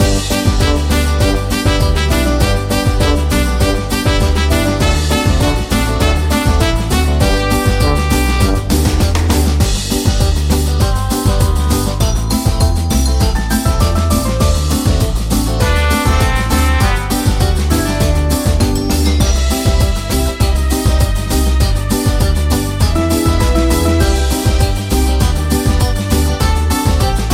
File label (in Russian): минусовку